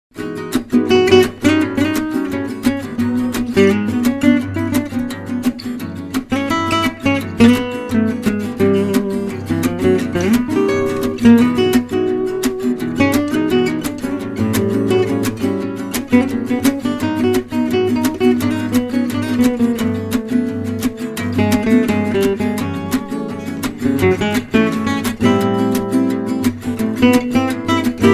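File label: Manouche melody; MyVirtualBand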